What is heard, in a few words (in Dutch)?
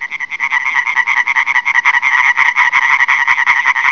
boomkikker